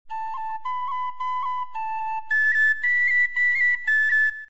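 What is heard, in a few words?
cuts, Tin-Whistle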